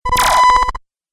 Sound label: Laser Beep Flutter